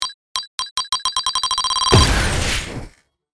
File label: Sci fi plasma grenade futuristic time bomb explosion